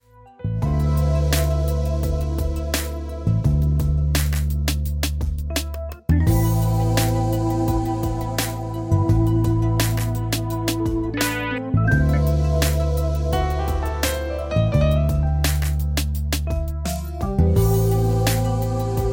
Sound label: Jazz brisk rhythm, ringtone; MIUI14; HyperOS 1.0